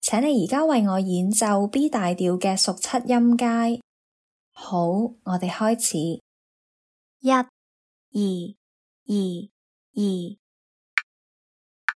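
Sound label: dominant 7th in the key of B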